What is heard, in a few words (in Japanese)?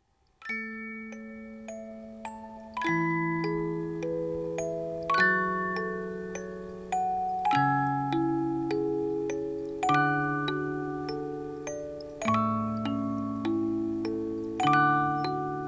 canon yukkuri, musicboxes